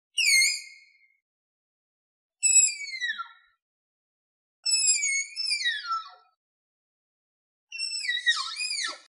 Baird's-tapir, حیوان تاپیر بیرد

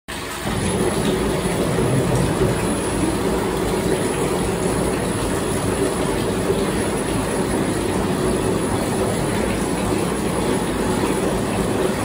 Jacuzzi water; آب جکوزی

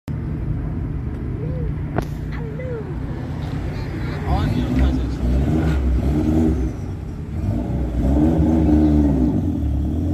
mitsubishi; اگزوز

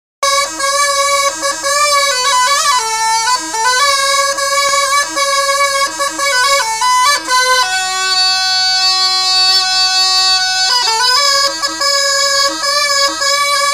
jofti, ساز جفتی

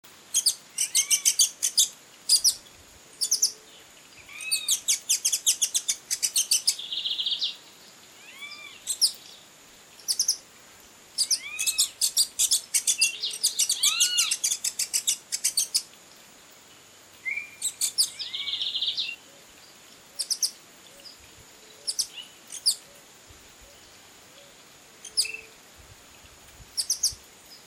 Horned Sungem, پرنده

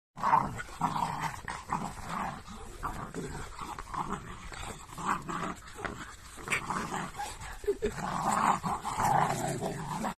Estonian Hound; تازی استونیایی

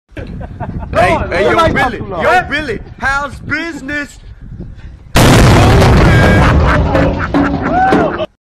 Carbide; کاربیت

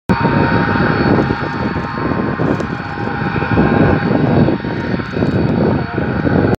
Ilyushin Il 62

